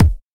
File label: clicky kick E key, steel kick drum, loudest frequency: 507Hz; kick drum samples; single hits one shots